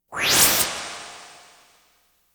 Science Fiction effects, Lasers, Light beams

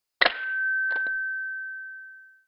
speaker off; Apotheorasis

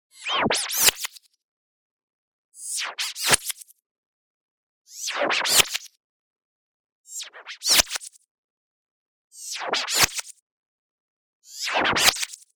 MOVEMENT FAST Sword Echo Filter Freak; boom library; Anime Essentials; DSGNMisc

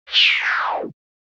computer bleep; NCH Library

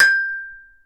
Hit Golfball Metal; SFX Kit; Misc Sports

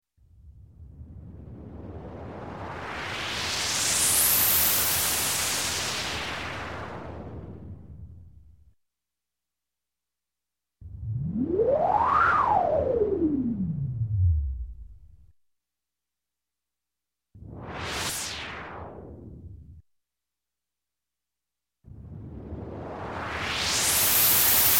Space, Whoosh Space Swoosh, Long, Electronic; Sound Ideas 2000 Series

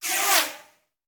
Bullet Pass By; Sound Ideas; Guns

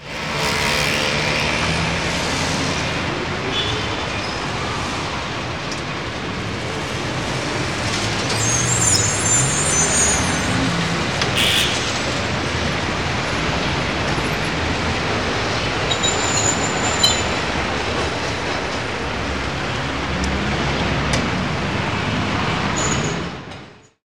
city mailand, street traffic; best service Blue Box

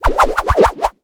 Swirl, Comical Effects; 1001 Sound Effects

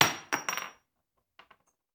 Bullet Shell; Sound Ideas; Guns

